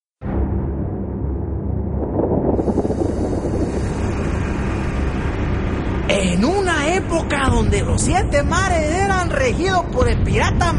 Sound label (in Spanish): sirene